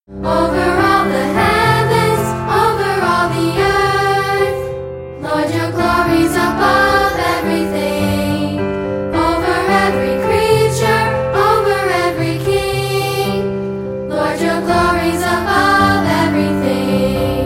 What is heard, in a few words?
Piano